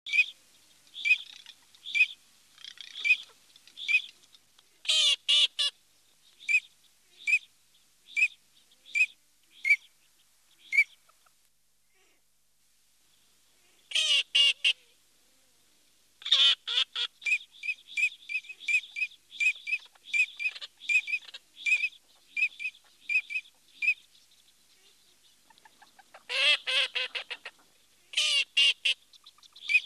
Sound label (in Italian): Alzavola